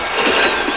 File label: CASH REG